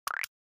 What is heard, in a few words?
staple bops